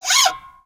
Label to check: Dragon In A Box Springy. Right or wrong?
Right